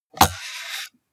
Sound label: computer mouse draw drop hard